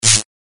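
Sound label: Player Hurt Electric; Acid Rising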